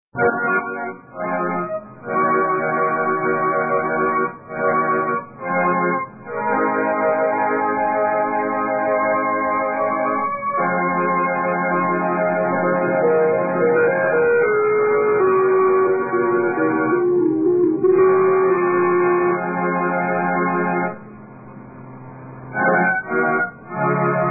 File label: piano